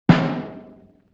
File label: Snare Drum Hit; Vintage Cartoon; Drums & Percussion